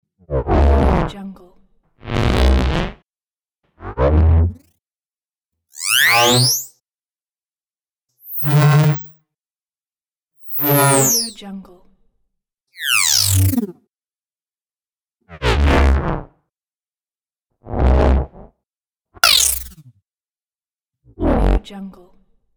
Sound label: spaceship